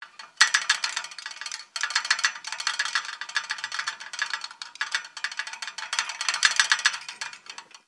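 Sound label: metal rattle dull; modern warfare 2; amb emitters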